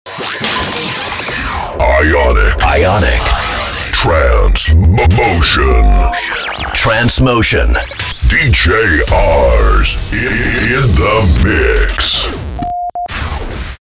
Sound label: jingle trance motion; Clubs; Jingles